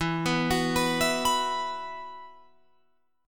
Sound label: E Suspended 2nd, Guitar Open F; Esus2 arpeggiated